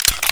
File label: deagle clip out, weapons